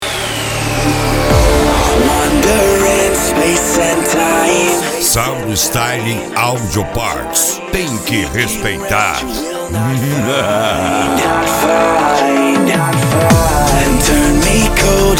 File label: sound style dubstep